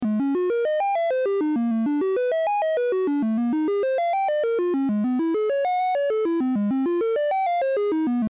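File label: lfo sample and hold tri stair; modular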